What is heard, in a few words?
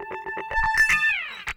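guitar; Zero G; Man Machine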